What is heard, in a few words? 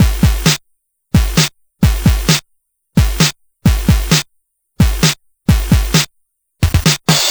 Game Boy Drum Loop; 8-Bit; Chiptune; Nintendo; Drums